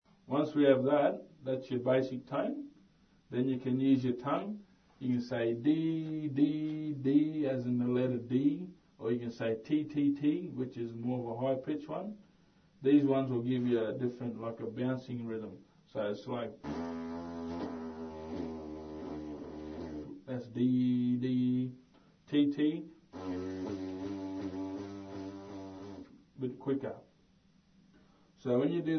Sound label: Didgeridoo teach